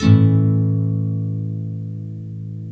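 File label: NYLON, A MAJOR UP, CHORDS; Spectrasonics; Hans Zimmer Guitars VOL-1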